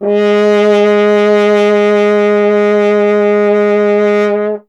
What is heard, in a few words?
F. Horn; Miroslav Vitous; Solo Instruments VOL-2